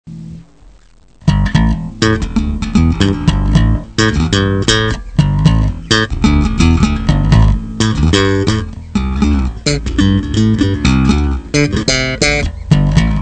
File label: Bassgroove